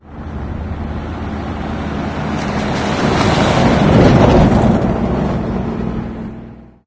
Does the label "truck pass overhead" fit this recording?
Yes